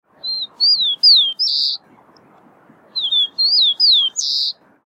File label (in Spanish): Chincol